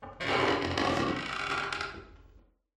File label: ambient wood board creak